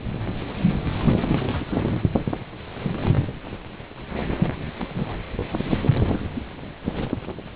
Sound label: flap